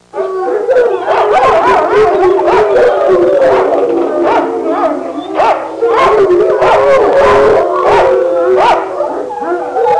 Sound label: Dog pack barking and howling